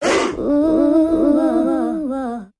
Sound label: R & B Vocal Variation